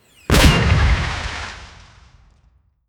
fireball launch sharp wh

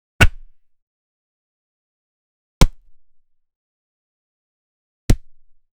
a huge hit with a, violence

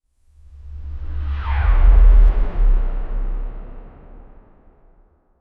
digital scroll sound, time travel

